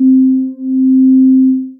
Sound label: Sine Pad, analog synth pad; Phil's Sine Pad; Ableton; Factory Packs; Synth Essentials